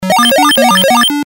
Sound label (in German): schnecke zerstoeren; snail against squirrel